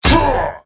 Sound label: Brute Attack